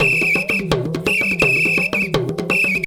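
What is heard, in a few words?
tama foudang groove; Best Service; World Colours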